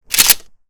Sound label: Remington 870 Pump; zps; Weapons; Firearms